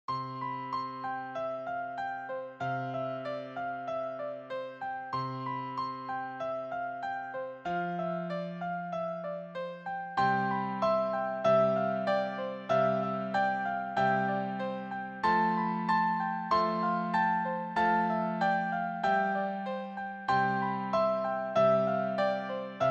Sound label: Handbells One Octave Duet with piano; Jesus Loves Me